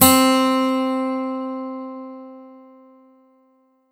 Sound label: Kurzweil K2000 Steel Str Guitar C4